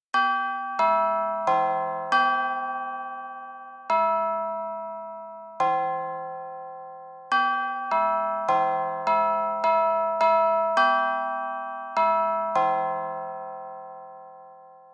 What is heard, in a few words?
Clock Tone